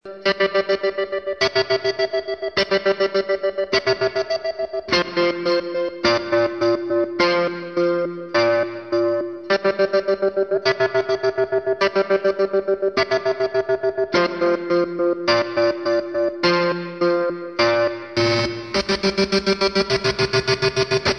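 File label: tremolo harmony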